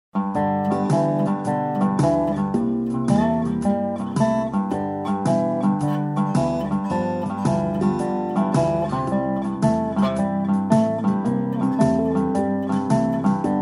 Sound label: Honky Tonk